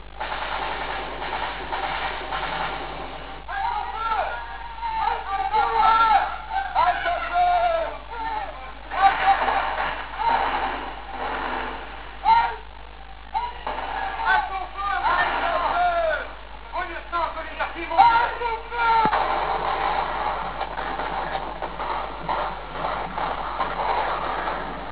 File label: halte 8bit